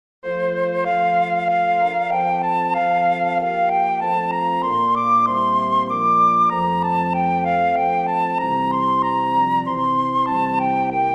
Away In a Manger What Child is This flute playback